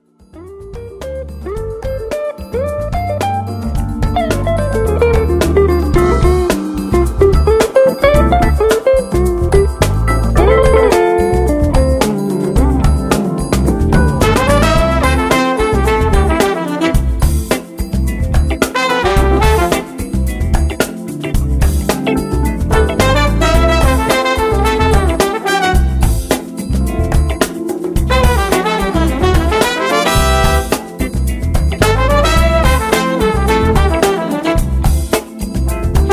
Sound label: Funk Orchestra